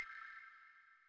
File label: Lose Air; Banjo-Tooie